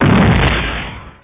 BOUM

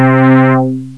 arpeggiator; Echo 1.1

